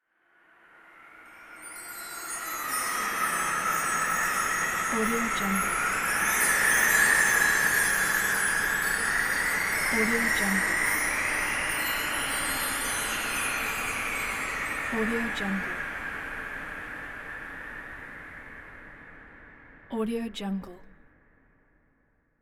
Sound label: wind christmas